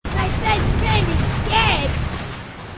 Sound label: grunt; h3dialogue; grunts